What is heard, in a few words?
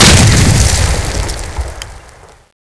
he grenade, weapons